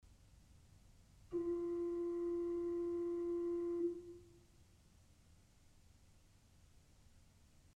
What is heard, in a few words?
Principal 4' f, orgel pipes